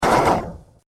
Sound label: Laser Machine Gun, science fiction sound effect; Laser Gun Sound Effects